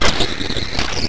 floater attack; hl1 npc

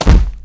vort foot; hl2 npc; ep2

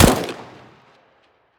famas; arccw go

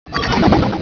archer pain; hl1 npc

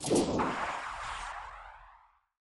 rocket fire distant, rpg; hl1 weapon

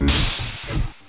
die; prdroid

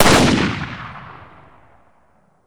sniper fire, combine sniper; hl2 weapon

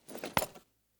knife falchion catch; arccw go

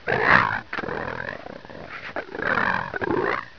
ag idle, agrunt

